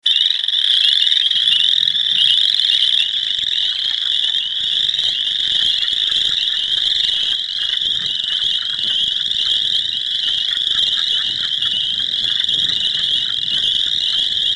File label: Frogs